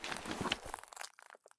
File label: prone glass; Escape from Tarkov